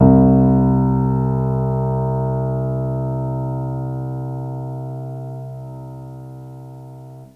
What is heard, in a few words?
Piano Upright c1 soft; TrashLibrary